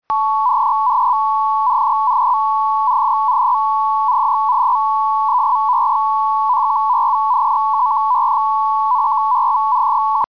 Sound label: psk hell